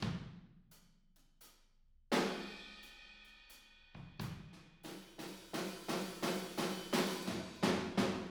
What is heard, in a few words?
Fill, Room, Drums; Sonic Foundry (Sony Creative Software); Drums from the Big Room The Mixes; Demo Projects